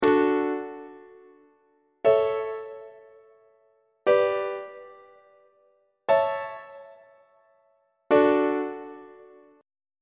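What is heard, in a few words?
d major inverted imperfect authentic cadence on treble clef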